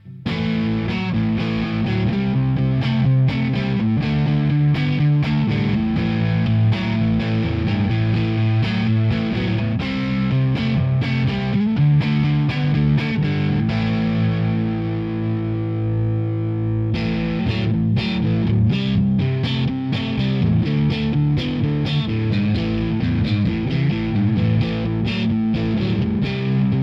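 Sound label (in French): rock 5p disto marshall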